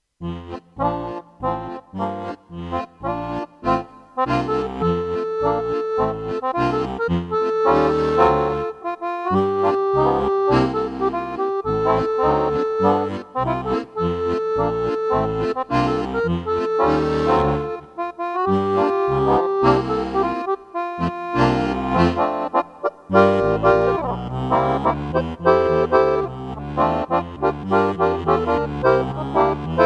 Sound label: nodrums